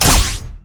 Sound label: Ball Flash; orianna